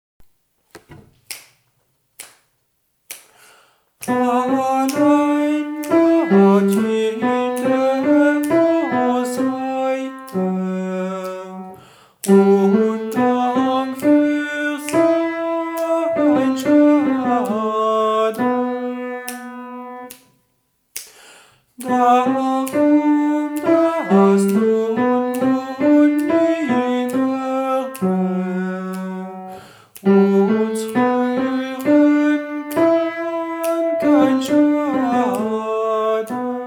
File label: allein gott ténor